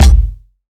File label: rich highs bass drum sound g key, Royality free bass drum one shot tuned to the G note